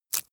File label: bone break small bright